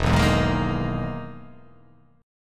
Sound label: E-Major Sharp 11th E